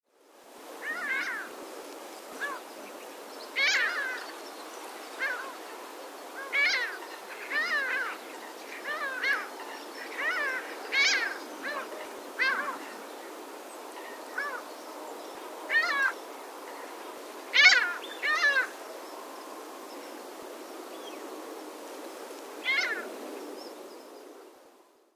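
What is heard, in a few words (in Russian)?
chernogolovaya chayka golos, Mediterranean gull voice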